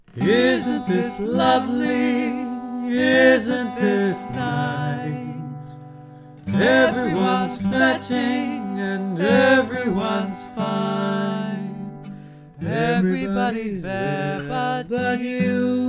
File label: advertising song; Song A Week Project